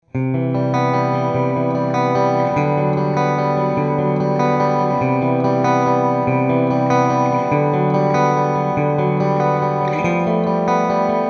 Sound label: fender squier bullet strat hss rw bridge mid clean picking